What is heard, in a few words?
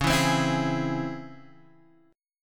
C sharp Minor Major 7th C sharp Guitar Standard; C#mM7 strummed; x,4,2,5,5,4; JGuitar